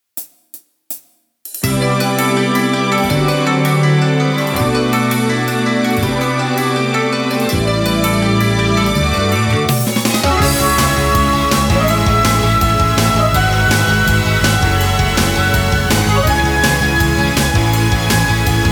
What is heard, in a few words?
Karaoke; sen goku hime senran ni mau otometachi soundtrack 2010